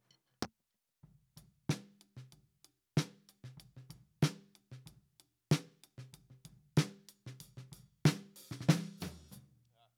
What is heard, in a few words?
Snare Top, 94bpm